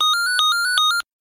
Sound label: Siemens Gigaset tone 1 ringtone